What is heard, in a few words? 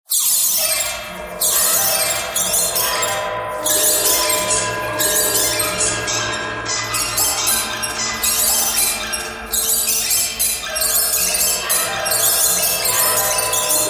birds thread pentatonic